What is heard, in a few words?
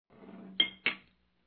Bottle Open